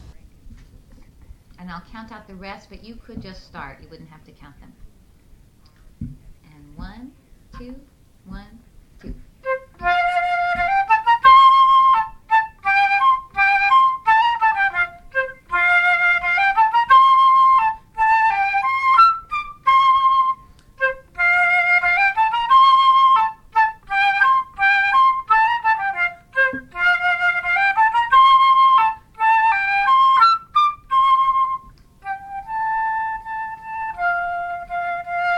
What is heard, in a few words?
Hunters Chorus Sax